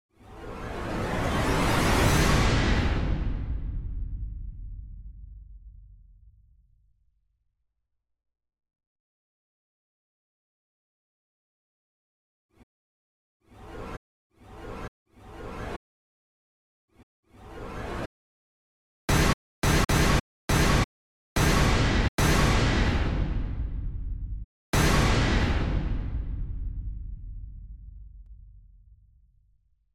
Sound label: RISE HIT